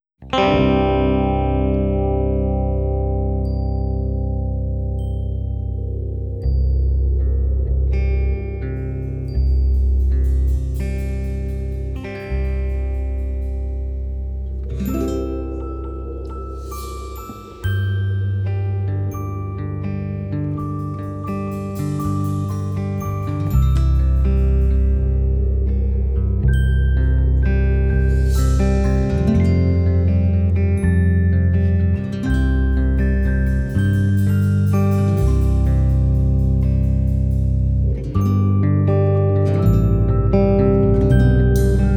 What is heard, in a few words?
Karaoke; Suesser die Glocken nie klingen